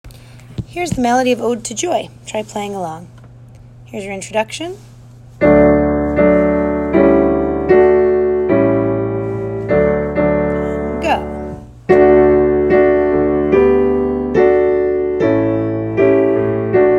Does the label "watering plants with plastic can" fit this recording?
No